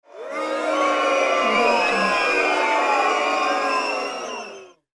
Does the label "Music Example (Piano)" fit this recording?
No